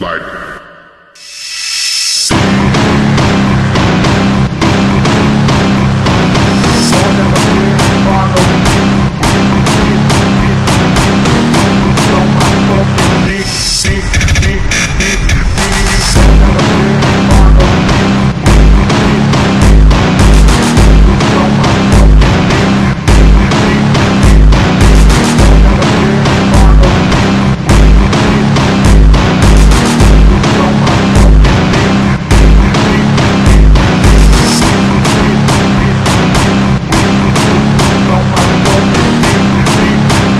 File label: phonk slowed